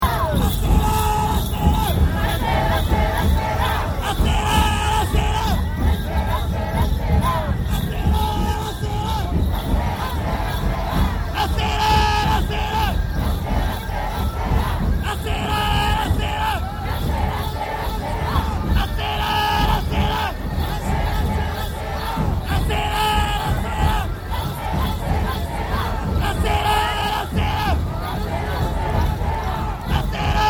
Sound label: AOMORI NEBUTA FESTIVAL CHANTING, Nebuta Fesitval in Aomori Japan; Concerts